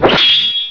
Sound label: Upper Spot Target; Crystal Caliburn™ DEMO